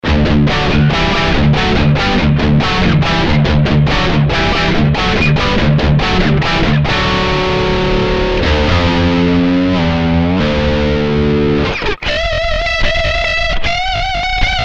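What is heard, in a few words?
tesla shark neck dirty, guitar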